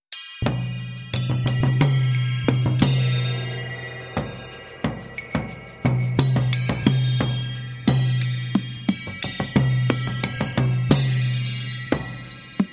Barking Bells

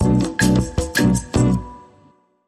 Clean Sting

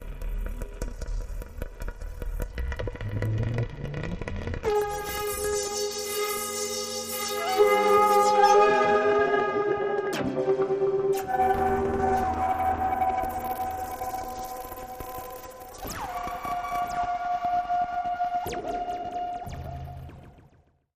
Music Pad

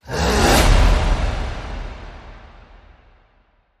Vocal Fury Growl Whoosh

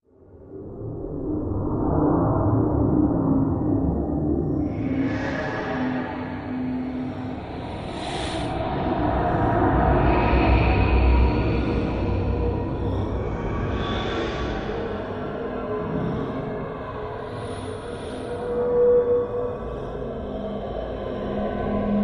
Not Of This World Ambience

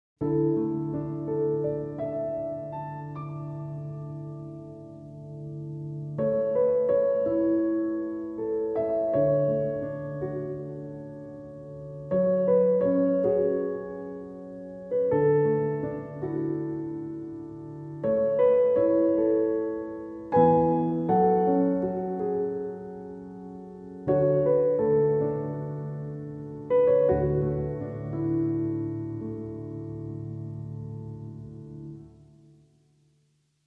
A Bed Of Nice Piano

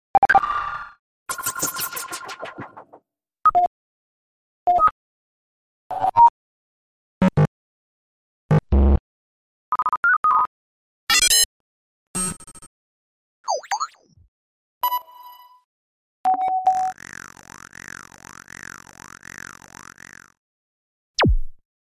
Blips

